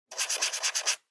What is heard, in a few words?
Felt Tip Pen (Fill)